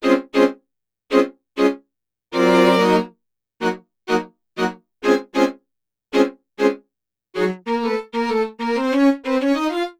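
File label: 096 South Hip Hop Cut Strings Eb minor; Pro Tools Essential Loops; Digidesign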